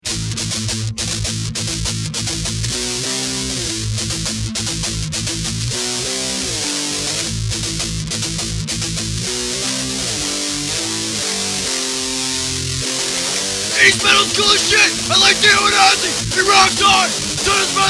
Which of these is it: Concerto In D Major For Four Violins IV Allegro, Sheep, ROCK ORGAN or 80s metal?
80s metal